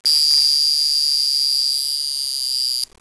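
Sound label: Alarm watch; toy; POLJOT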